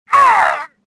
choke; stofficer

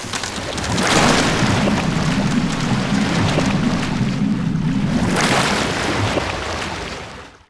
ship destruct; Sid Meiers Pirates; XBOX

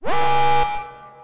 alarm; Star Wars Knights Of The Old Republic II; XBOX

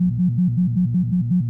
computer; Star Wars Knights Of The Old Republic II; XBOX

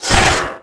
revert metal, skater; Tony Hawk's Project 8; XBOX

